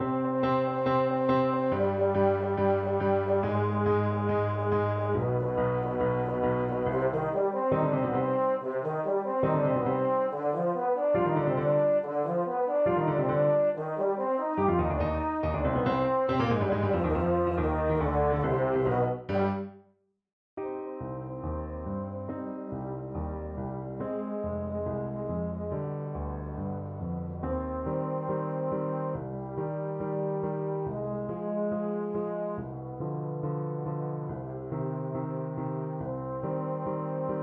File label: EUPH, euphonium